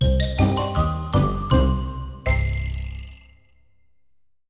slot intro

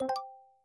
win coin balance update

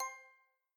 win normal coin count end

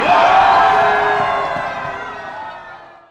jackpot start

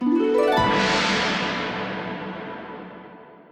slot intro

